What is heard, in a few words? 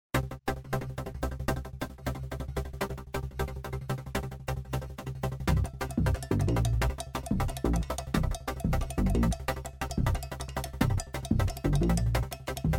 drums